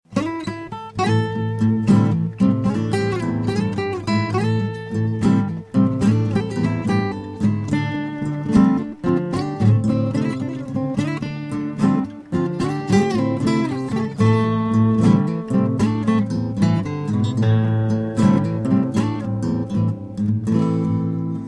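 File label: motiv blues, guitar; ami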